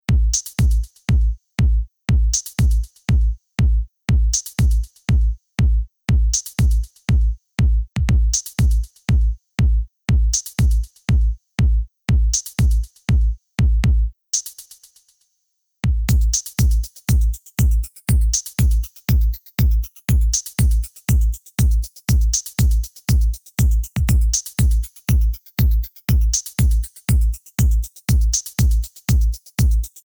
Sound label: Submarine only bass